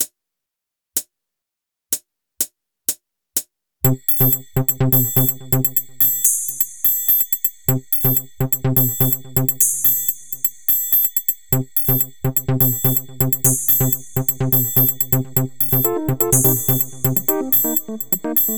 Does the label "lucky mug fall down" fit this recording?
No